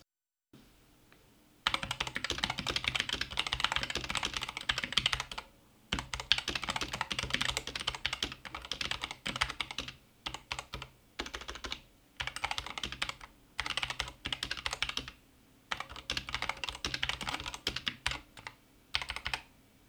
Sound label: Corsair K100 RGB